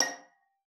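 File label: violin spiccato A#5; Xsample VOL-5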